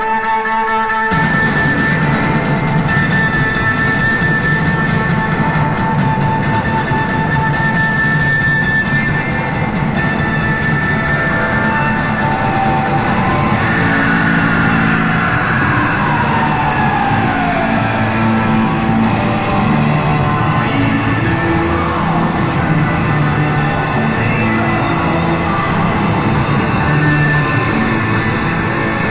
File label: Drum Solo; Brussels 1972; 08-16-72